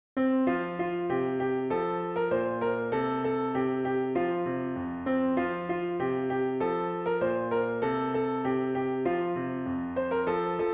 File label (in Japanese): ピアノ